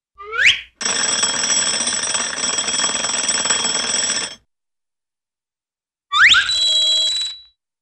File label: Ascending Slide Whistle & Alarm Clock-like Bell, Cartoon; Hollywood Edge; Cartoon Trax